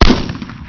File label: M14 Single Shot, gun